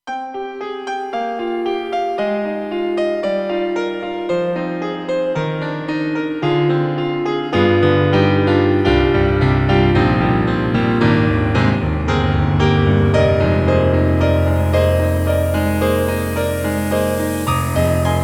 KORG Star Piano, TRITON-Rack; EXB-PCM08

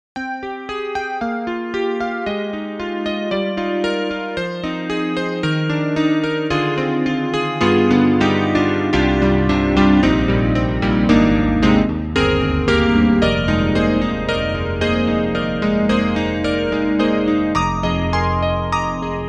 YAMAHA MOTIF-RACK XS, CP70 Chorus

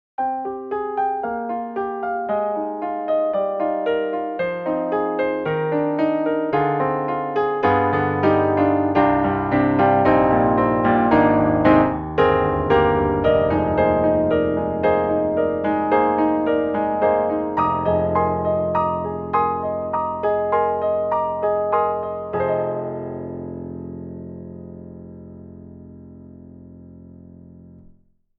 YAMAHA MOTIF-RACK XS Old Blues

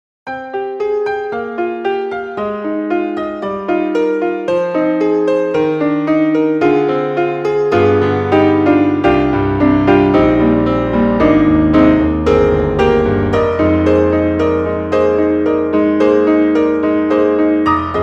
Grand Piano Bright; SONiVOX; DVi Sound Stage

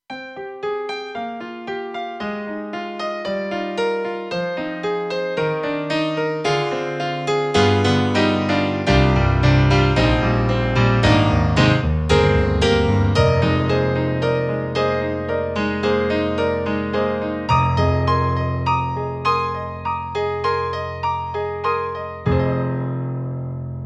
KORG Studio Piano, TRITON-Rack; EXB-PCM08

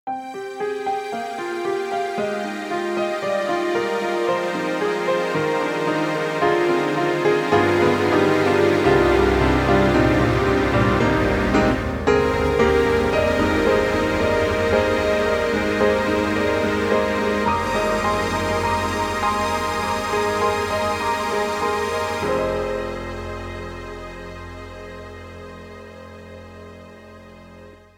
Berlin Piano and Strings; KORG KRONOS 2

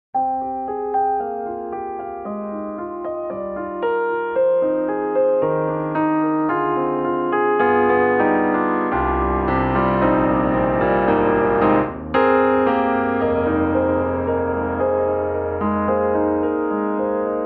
Rosewood Grand; Orange Tree Samples; Evolution Rosewood Grand; Rosey

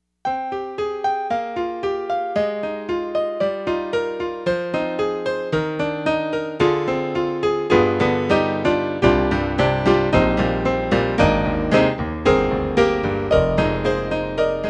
Yamaha TG55 Class Piano

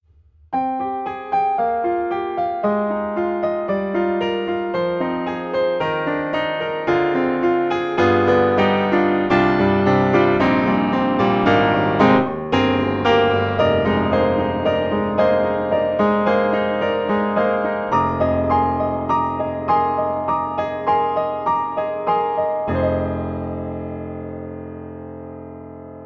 Pianoteq D4 Jazz; MODARTT S.A.S.

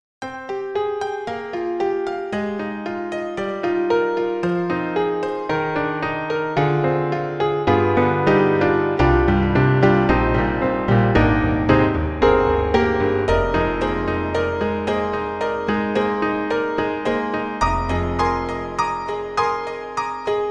Piano In Da House; Steinberg

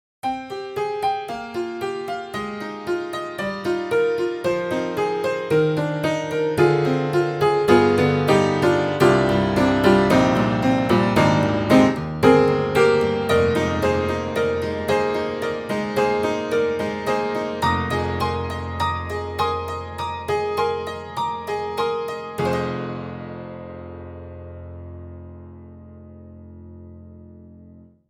Tack Piano Jangle Piano; Upright Pianos; Synthogy; Ivory II

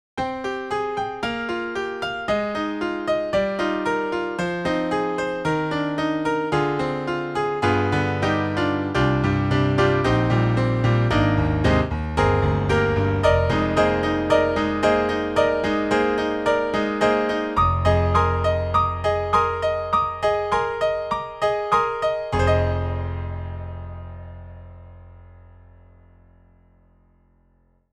Piano; IK Multimedia; Sonik Synth 2

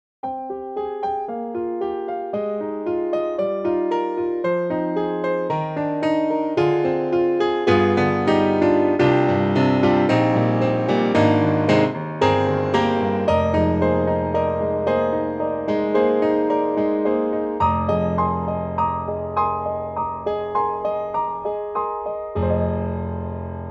concert grand; IK Multimedia; SampleTank2.5XL; Concert Grand ST vfade

